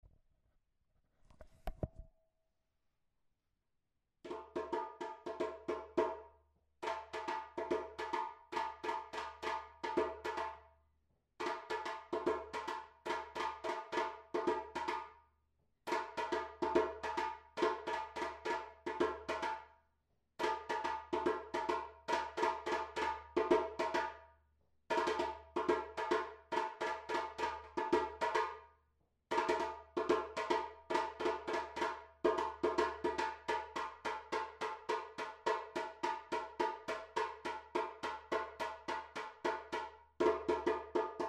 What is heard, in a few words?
sunu solo